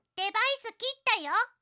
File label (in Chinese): 裝置連線中斷